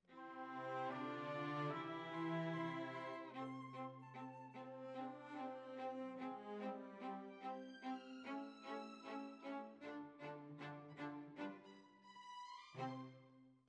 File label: Violas, jazz for piano